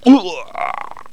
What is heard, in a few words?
daemon die; megaglest